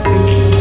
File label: wrong